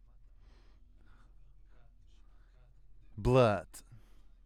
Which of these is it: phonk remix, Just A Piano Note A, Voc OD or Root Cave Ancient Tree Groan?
Voc OD